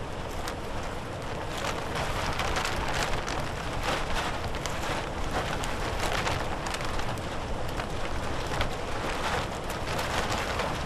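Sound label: tarp movement plastic close; Titanfall 2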